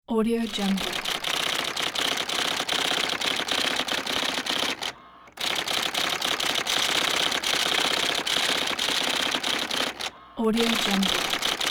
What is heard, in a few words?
electric typewriter